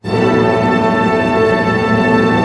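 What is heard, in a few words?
tremolo A fortissimo, orchestra; Sound On Sound - Peter Siedleczek's Orchestra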